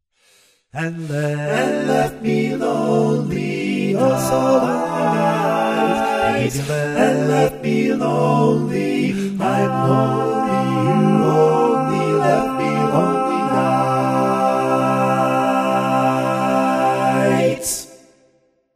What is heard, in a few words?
All Parts, Barbershop Tags, Upbeat Version; Each recording below is stereo - one part on one side, the other parts on the other side.